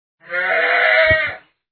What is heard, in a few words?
sheep death